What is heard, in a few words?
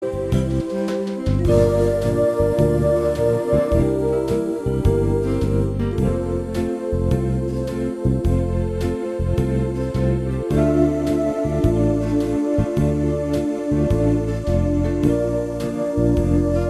jazz